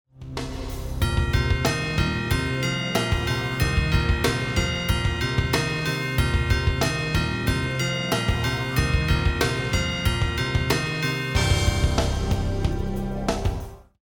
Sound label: lost round; music kits custom